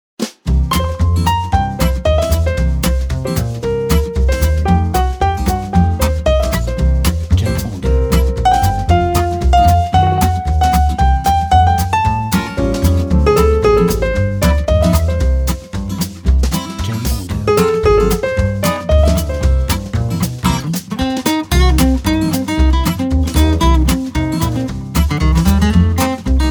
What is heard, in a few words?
Upbeat Jazz